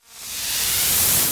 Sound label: Sweeps Short